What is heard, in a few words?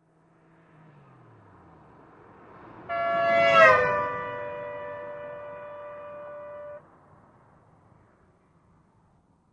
Cars Doppler Horn By, horn